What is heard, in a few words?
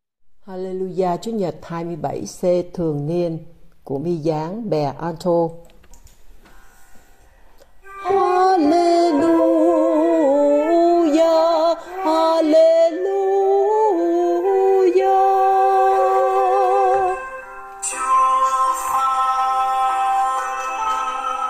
Alleluja Alto; ca doan teresa